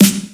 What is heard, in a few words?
KIT, Dance Mega Drums, HIP; Best Service